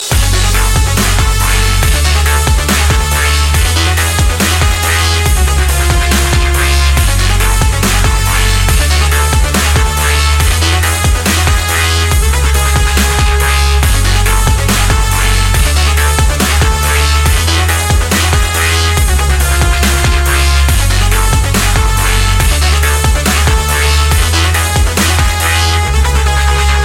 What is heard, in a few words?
Dubstep